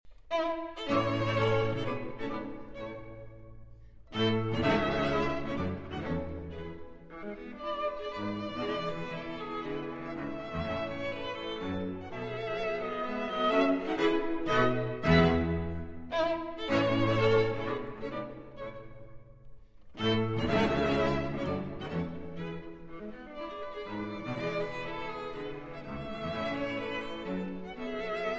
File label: String Quartet No. 15 in A Minor, Op. 132 IV. Alla marcia, assai vivace; Beethoven